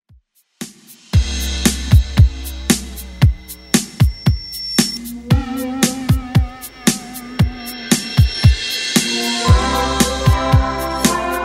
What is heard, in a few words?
80s Redrum 115bpm